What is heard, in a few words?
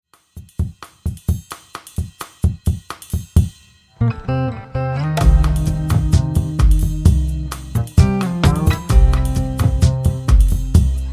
Jazzy